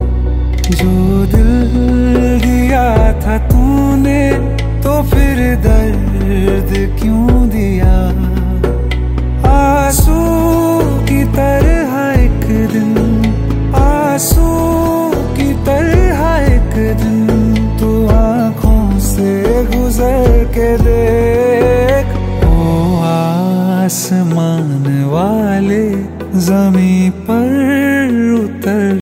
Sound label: hindi ringtone, bollywood ringtones